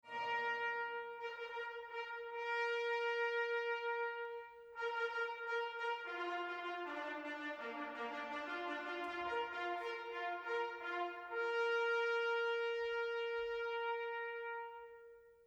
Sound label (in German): Fidelio Trompete; Mit Beethoven durch das Jahr